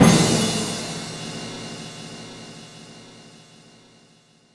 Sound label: Perc Hit; Roland M-OC1